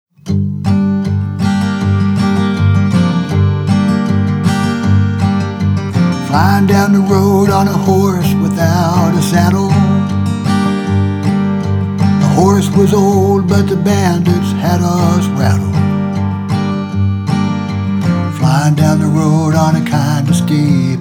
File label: Western Drama